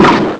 screech; Amiga Soundtracker Sample Packs

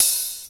ANALOG COMBO; OMI - Universe of Sounds

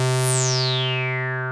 303 FAT, BASSES; Pro-Rec - Synthsonic

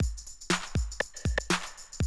120, Classic Drum Loops

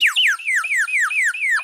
KO Birds, Classic SFX, cartoon; musicradar; cartoon caper

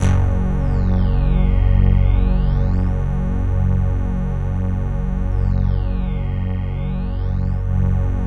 CHROMA F#1; Music Store Sound Service; Einstein; MOSTLY LEADS

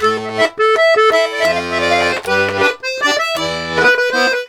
LARIDE, Accordions; USB Soundscan vol.40; AKAI

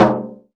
Dofs Nur Rim, Roland BK-5 Percussion

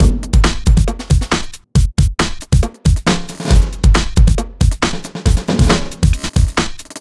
drum loop, drum n bass

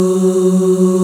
VOX F#2, Vox Percplex'd

